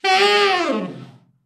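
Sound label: TENOR overblow FALL, Sax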